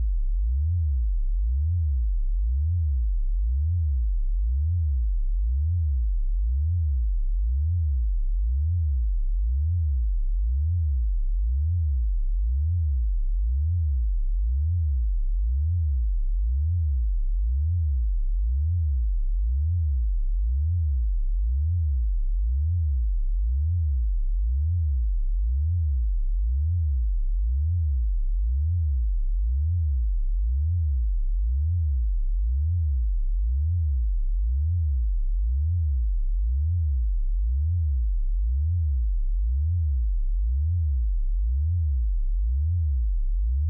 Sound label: Warbled 0060Hz